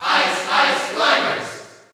Cheer Dutch; Ice Climbers; SSBU

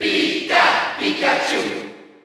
Cheer Dutch; Pikachu; SSBU

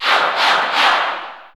Cheer English; SSBU

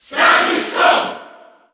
Cheer French; Dark Samus; SSBU

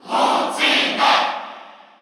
Cheer Russian; Lucina; SSBU

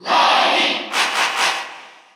Cheer Russian; SSBU

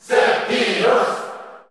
Cheer Korean; Sephiroth; SSBU

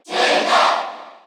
Cheer Korean; Zelda; SSBU

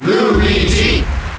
Cheer; Luigi; Melee

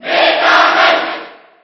Cheer JP; Meta Knight; SSB4

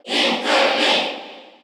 Cheer Korean; Inkling; SSBU

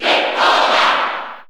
Cheer Japanese; Greninja; SSB4; SSBU

